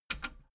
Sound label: metal button radio click enable fast heavy